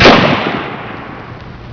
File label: GUN